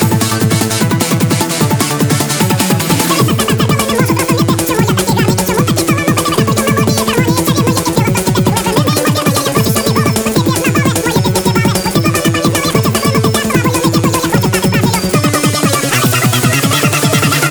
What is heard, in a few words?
pitch